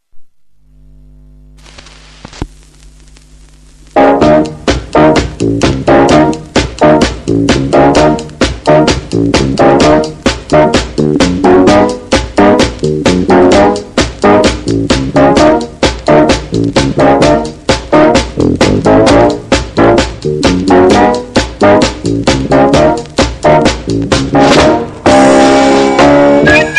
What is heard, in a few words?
Fife Piper